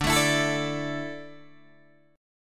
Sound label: D Suspended 2nd D down Guitar DADGAD